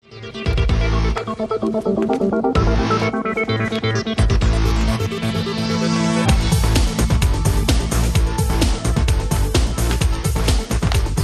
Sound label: music